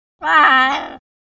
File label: Macaw Vocalizations. A Macaw Says Bye. Close Perspective. Bird